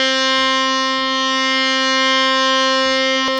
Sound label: HARMONIUM, World Instruments; Best Service ProSamples vol.52 - World Instruments 2; AKAI